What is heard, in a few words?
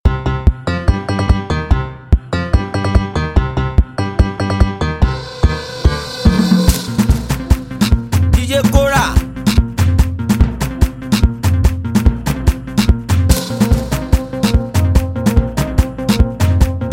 Jungle Rhythm